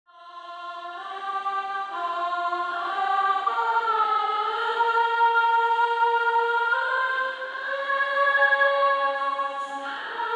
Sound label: womens drama choir